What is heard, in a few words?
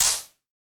Wider Clap